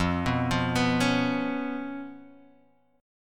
B Suspended 2nd Flat 5th F

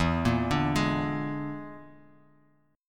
E 7th Flat 5th, Guitar, Open F; arpeggiated; chord; JGuitar

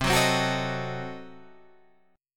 C Diminished 7th C down Guitar Open G

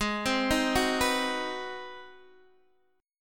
Ab 7th Sharp 9th Guitar Open D, chord

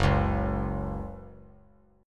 F sharp 5th F sharp; strummed; JGuitar

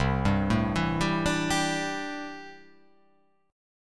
C 6th Add 9th C down

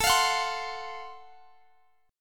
F sharp Minor Major 7th Flat 5th A

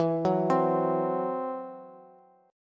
E Suspended 2nd Sharp 5th, Bass; Esus2#5 arpeggiated; x,19,16,17

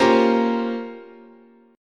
A sharp Minor 6th Add 9th, chord

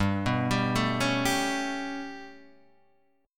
B 7th Suspended 2nd Sharp 5th, chord

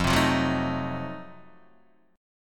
F 7th Flat 9th, Guitar Open D

